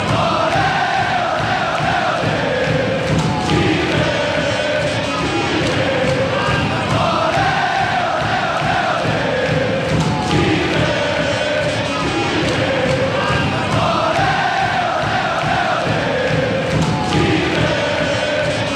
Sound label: ole ole ole ole chile, fanchants